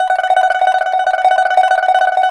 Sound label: PIPA tremolo F#6; Spectrasonics; Heart of Asia